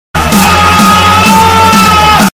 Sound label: quack flash; cstrike